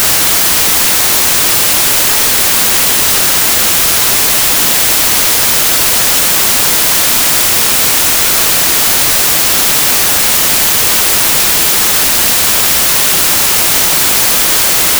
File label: White, Noise; Denoising